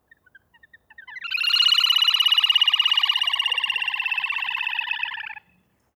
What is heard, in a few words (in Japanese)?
kaitsuburi, birds